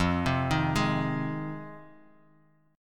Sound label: F Minor 7th F; JGuitar